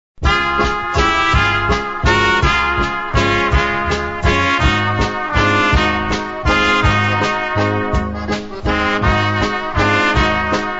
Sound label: waltz